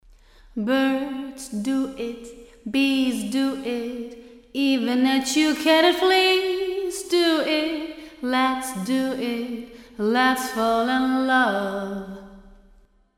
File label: Vocal Plate